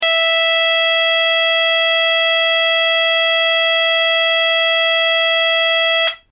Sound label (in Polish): ton